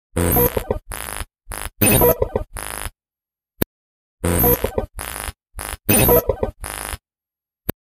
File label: Corrupt